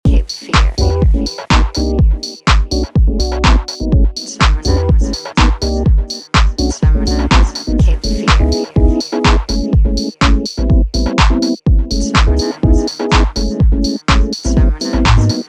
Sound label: lofi; cape fear